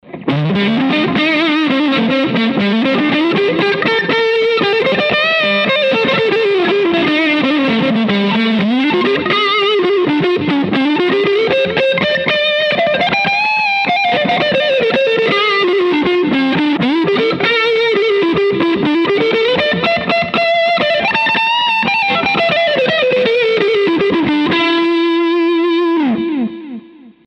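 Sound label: prs neck dirt lead; prs22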